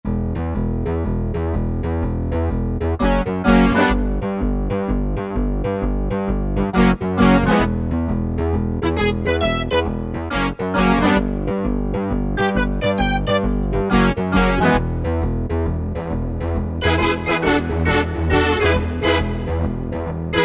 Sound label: Korg Wavestation A-D